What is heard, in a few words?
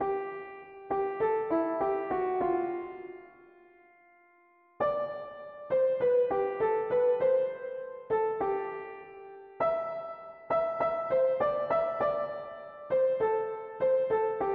mono piano; hymns